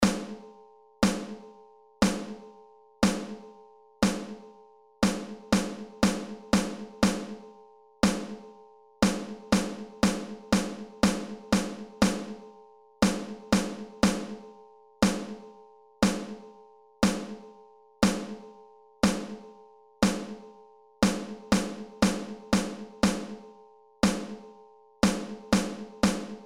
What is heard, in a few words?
Rhythms Snare Drum